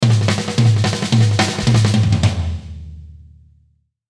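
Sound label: Musical Element, Drum Snare March; Blastwave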